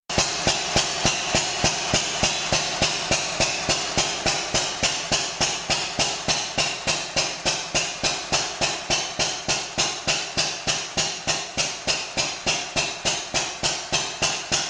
hit and miss engine

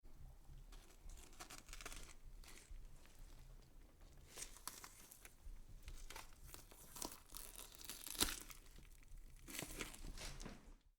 wet meat cutting and peeling

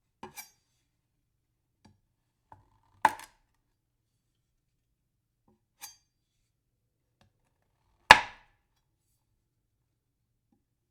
knife chop on wood

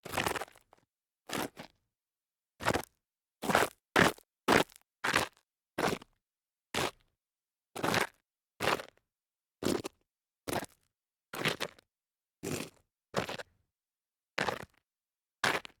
shoe scuffing on gravel

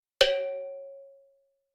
cartoon cowbell

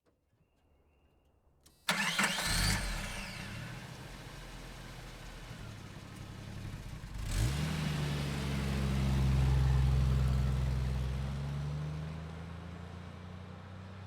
suv drive by

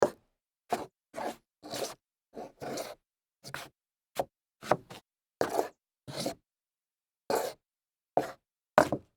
scuff solid wood with heels

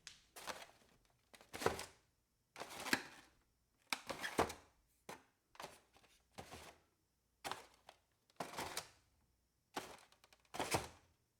styrofoam carton

